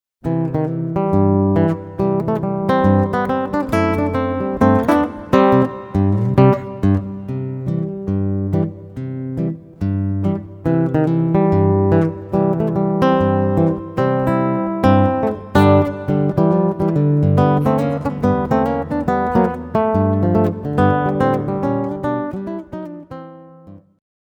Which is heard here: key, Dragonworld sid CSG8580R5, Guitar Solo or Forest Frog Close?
Guitar Solo